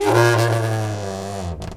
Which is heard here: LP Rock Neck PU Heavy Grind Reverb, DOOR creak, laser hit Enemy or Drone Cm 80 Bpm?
DOOR creak